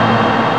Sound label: yello noise; ST-XX Amiga Soundtracker Sample Packs